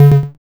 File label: bbounce; pong